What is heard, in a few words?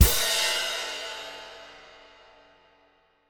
roll finish